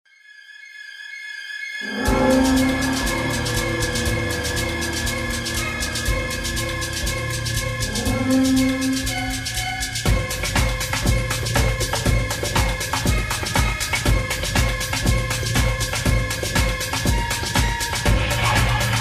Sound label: game startup; mysteryhouse